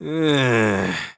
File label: "nyeee"